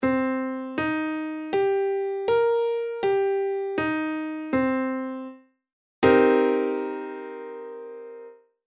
min min7, accords de septième

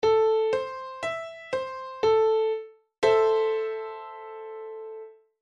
degré VI; tonalite majeure

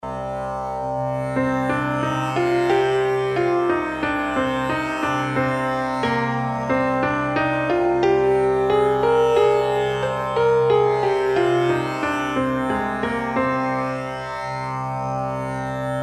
eolien musique, les modes

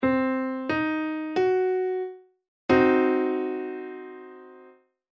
accord diminué

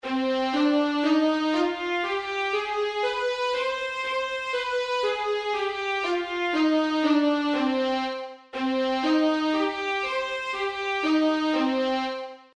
mineur harmonique, gammes; faites vos gammes

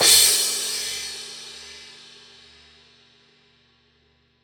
cymbale inversee

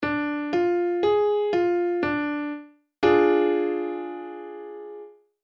degré IIm, accords degrés tonalité mineure

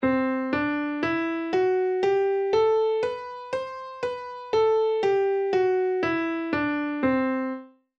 lydien, mode; comment identifier un mode a oreille

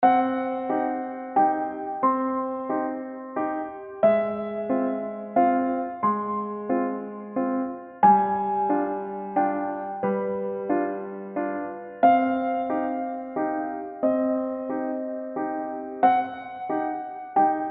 appo descendante ascendante; notes etrangeres

